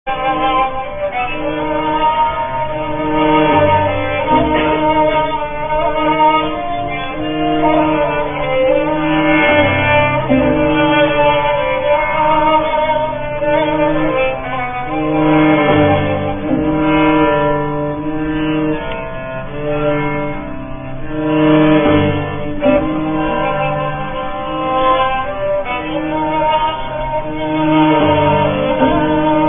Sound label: Shahr Ashoob, ساز شهرآشوب